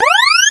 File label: burgler alarm; pyledriver